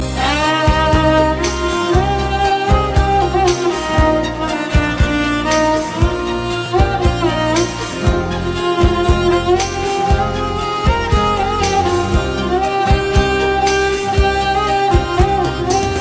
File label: amazing instrumental; ringtones